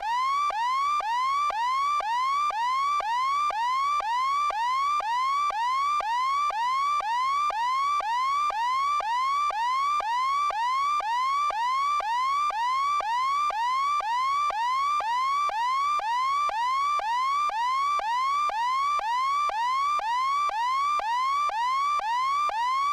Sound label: Warning Siren